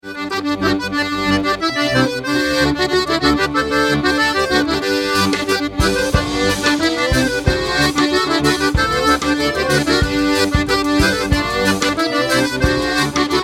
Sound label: Danza